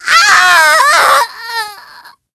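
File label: die, alien controller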